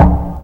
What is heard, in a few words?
hit, PERC; Techno Trance Essentials